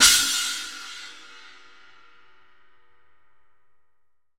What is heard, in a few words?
China, EMU PX-7 Drum Machines